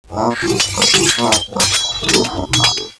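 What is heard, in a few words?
sword fight; Civilization 2 Multiplayer Gold Edition; APOCALYP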